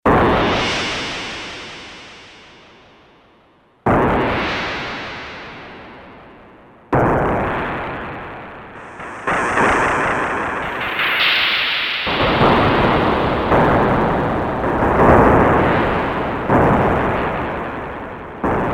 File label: Explosion, Yamaha TX802; Factory Patches; Voices